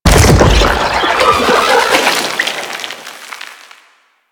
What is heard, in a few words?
Octopede Unearth Throw; Warframe; Man In The Wall